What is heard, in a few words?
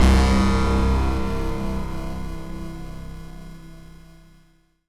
bell hit